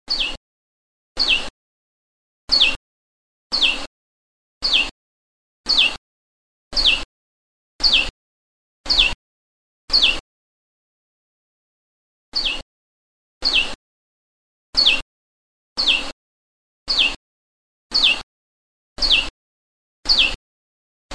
suara panggilan burung prenjak lumut

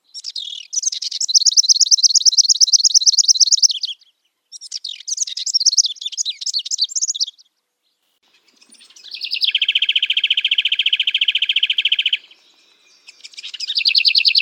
master jalak suren

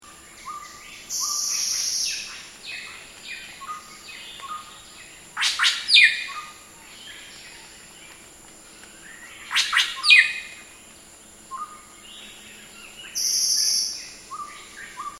suara alam cililin

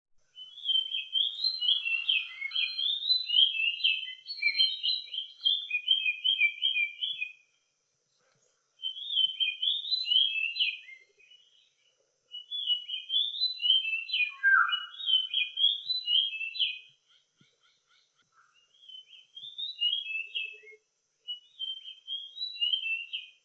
kacer fiipina

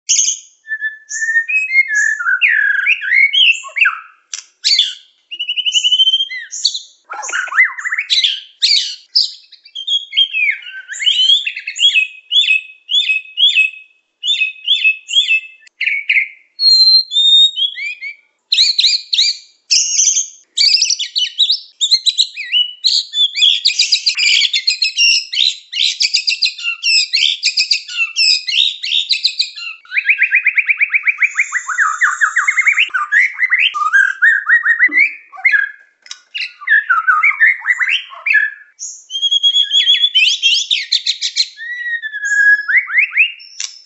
murai batu medan

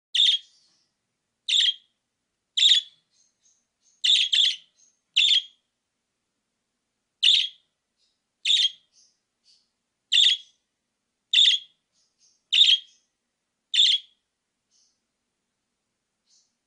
master burung parkit

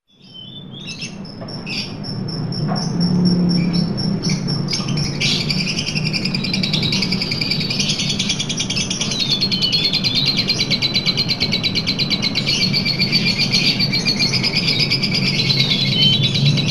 audio lovebird ngekek panjang

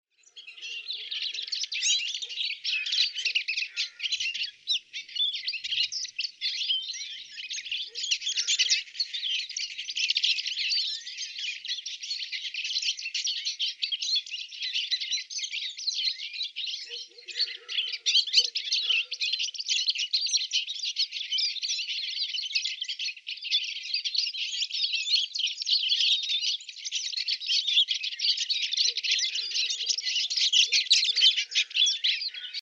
suara siskin hitam

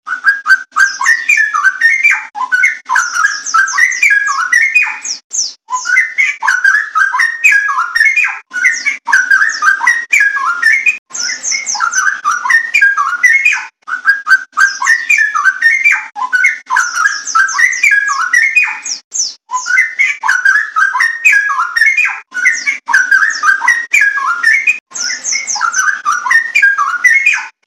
suara burung cucakrowo nglagu rapat

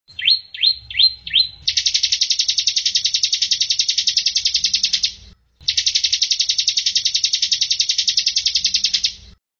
audio ciblek untuk kenari isian

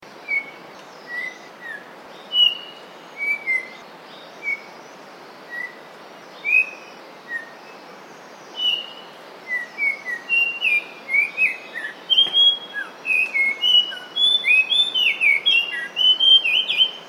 kicauan cingcoang biru

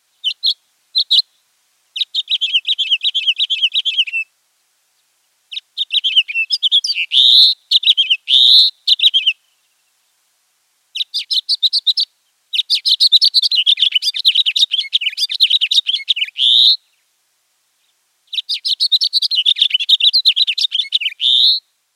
suara house finch

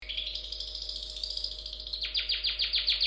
varian lagu burung cendet dari suara kenari

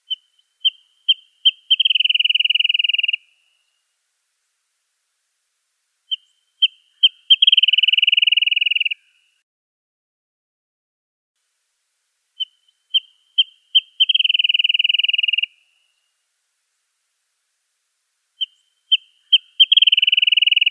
suara kicauan burung wrentit

